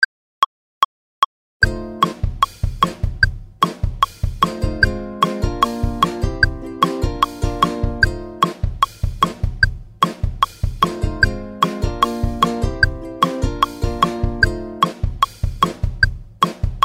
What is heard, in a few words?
Happy Strumming Verse Full Tempo